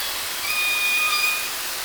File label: call, Orca; SRKW; Alwin